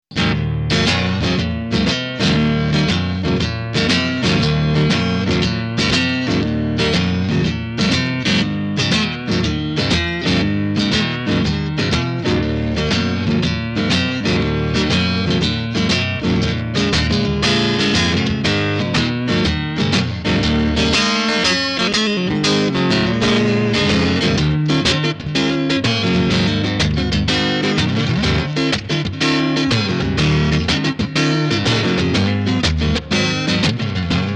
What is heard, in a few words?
Blues, MultiFX; iRig UA